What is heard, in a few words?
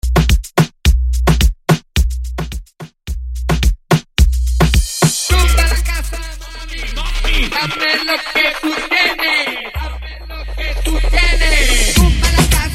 intro dembow 108bpm